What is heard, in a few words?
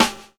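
snare drum funk, drum kit; E-Lab; X Static Goldmine 2; akai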